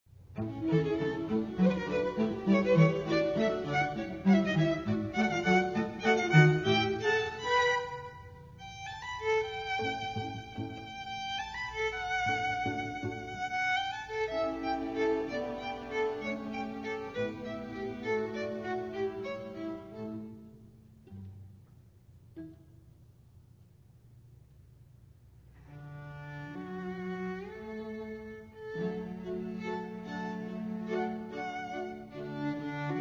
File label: Quartet Reception blue danube; strauss